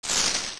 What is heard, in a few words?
Skulltula Descend; zelda; OOT